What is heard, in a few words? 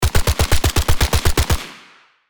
FN SCAR assault rifle short burst; gfxsounds